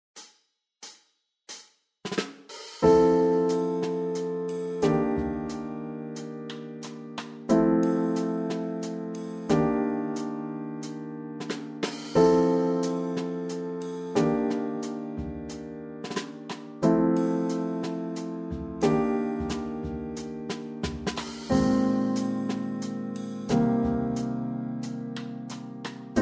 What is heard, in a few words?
Descending by Step Pattern C Accompaniment